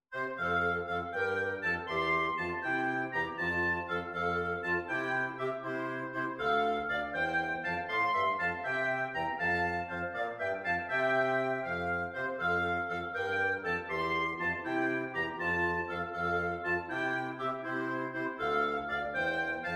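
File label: I Saw Three Ships oboe clarinet bassoon; Christmas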